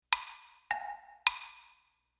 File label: Tick Tock